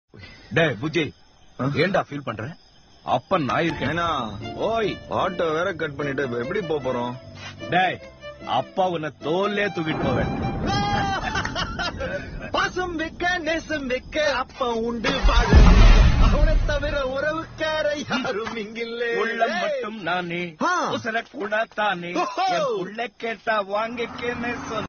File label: Appa Love Tamil